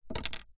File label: comb plastic set down on wood; household